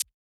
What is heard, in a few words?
Modern hihat, Electro